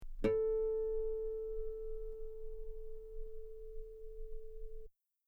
guitar A4 very long forte harmonics; bananenkeyboard

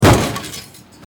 car To Car Crash; teststrecke game

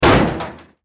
car To Car Crash; teststrecke game

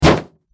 Car Crash; teststrecke game

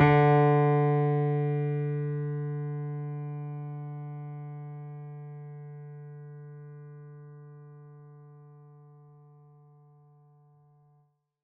mini grand; bananenkeyboard

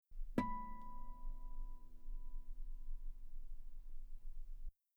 guitar B5 very long forte harmonics; bananenkeyboard

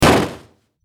car To Car Crash; teststrecke game

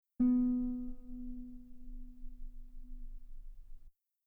guitar B3 very long piano normal; bananenkeyboard

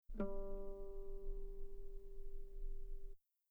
guitar G3 very long piano harmonics; bananenkeyboard

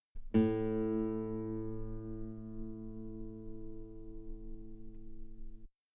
guitar A4 very long forte normal; bananenkeyboard

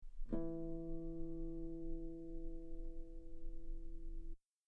guitar E3 very long pianissimo harmonics; bananenkeyboard

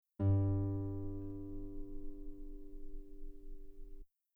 guitar G2 very long piano normal; bananenkeyboard

